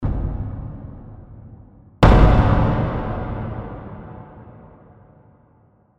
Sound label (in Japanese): dadaiko